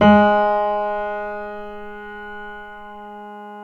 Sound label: PNO MF; Synclavier Sampler Library